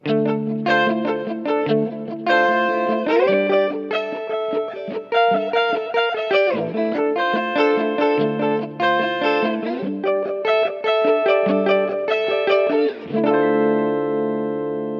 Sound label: Intelligent Harmony Mode Perfect 5th Up Minor